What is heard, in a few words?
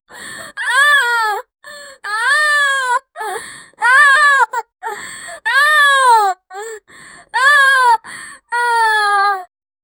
anime girl crying; emotions